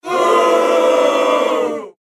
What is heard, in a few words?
Crowd Boo